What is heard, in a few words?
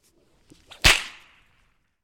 Whip Crack